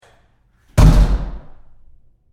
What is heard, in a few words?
Door Bathroom Stall Close Roomy Hard; Big Room Sound